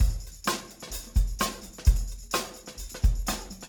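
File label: beat, Room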